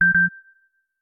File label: Beep-Beep; ringtones; Samsung Galaxy Z Fold8 Ultra; Sound Theme